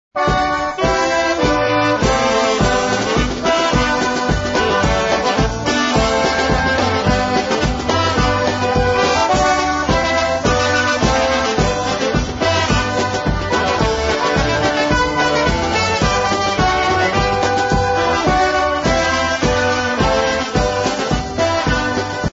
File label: GALLOP POLKA